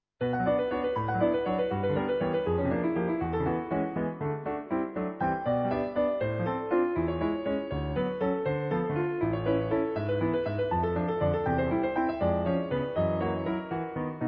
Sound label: Piano folk; Valzer